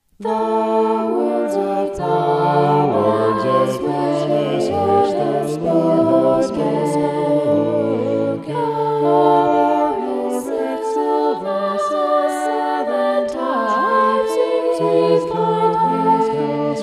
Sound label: vocal poly; psalm 12